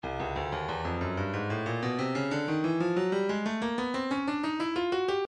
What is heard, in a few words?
breakbeat 093 bpm; Stylus; Break Feast